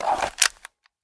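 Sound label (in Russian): шум перезарядки арбалета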